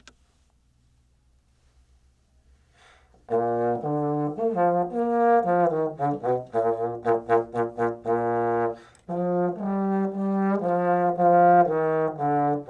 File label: Menuett Baritone